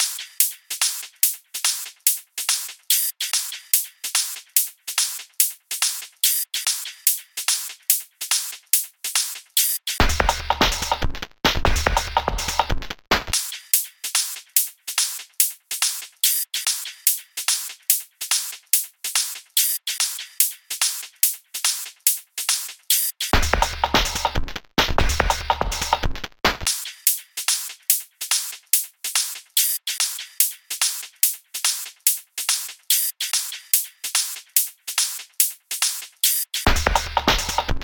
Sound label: driving fast song Dubstep